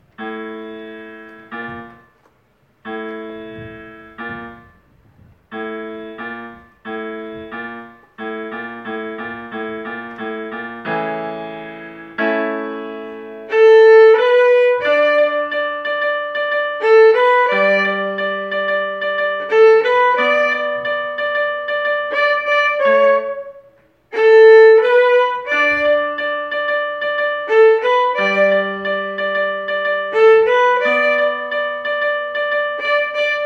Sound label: violin